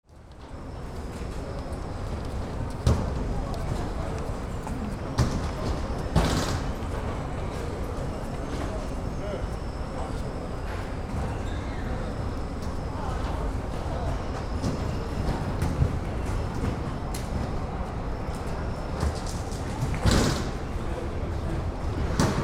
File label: Airport ambience interior security control